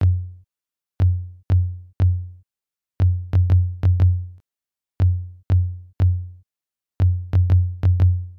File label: tom 20ms